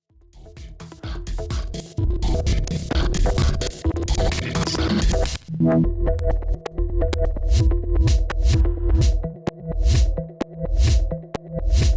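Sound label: Denon DJ X1800 Scratch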